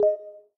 S Beam error; 三星note10手机系统铃声

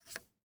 Pen det noti; 三星note10手机系统铃声

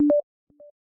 SideSync Connected; 三星note10手机系统铃声

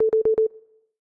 Alert on call; 三星note10手机系统铃声

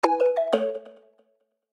Sticky, notifications; 三星note10手机系统铃声

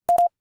Pen det alert; 三星note10手机系统铃声

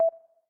Volume control; 三星note10手机系统铃声

